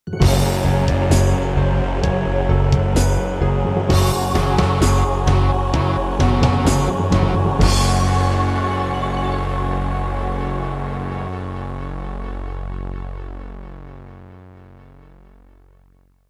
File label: Introduction, Roland XV-2020; Doom (Sigil)